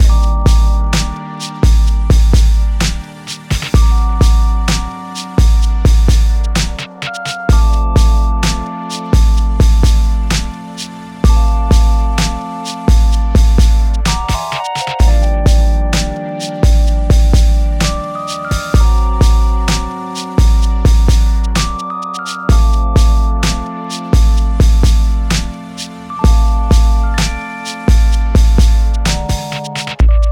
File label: 064 Gmin, Smooth RnB